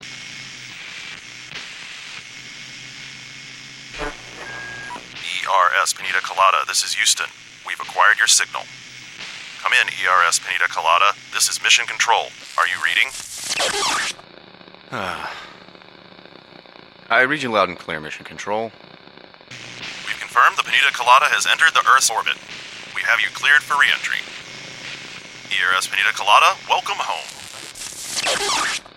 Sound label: Epilogue Vocals; PixelJunk SideScroller; PS3